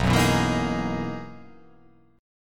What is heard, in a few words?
B Major 13th B down